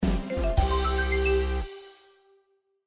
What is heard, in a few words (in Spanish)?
Musica Victoria; Surface Rush